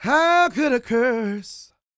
croon, Vocal Planet Blues Soul; Spectrasonics

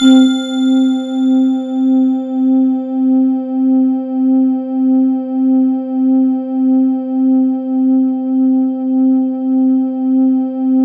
C4, Synth Line; 90 s Sample CDs; NiceBeats; SynthLine VOL-32

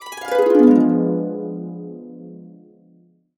D DIM7, Harps; USB Soundscan vol.62 - Complete Harps; AKAI

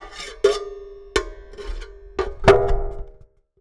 Raw, Wire & Tin, contact mic

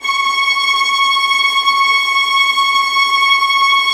VIOLINT, Synclavier Strings; ILIO

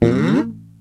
DOWN, ELEC BASS; East West - Global Sounds

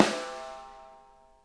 SNARE EDG; Sonic Reality; Interactive Drum Kits

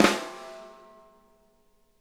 flam, snare; Sonic Reality; Interactive Drum Kits

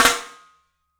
MARFLM, 3D Drums, MARCHIN ROL; 3D Sound

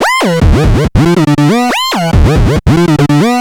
Analoop, Industrial; Analog; Analoops; Sonic Foundry (Sony Creative Software)

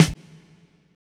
SDA, Disco Fever drum samples; Best Service ProSamples vol.48 - Disco Fever; AKAI

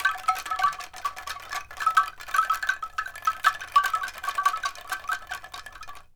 ANKLUNG; ILIO; Synclavier Percussion 2; World & Orchestral

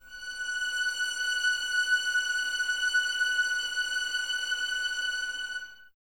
F#6, Violins Exprsive, String Ensembles; Miroslav Vitous; 11 Violins

